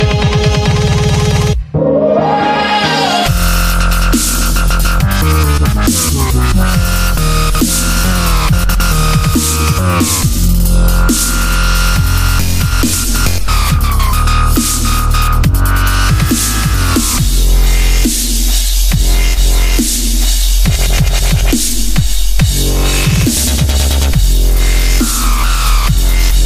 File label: dubstep